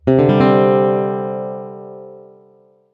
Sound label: c sus2, guitar chord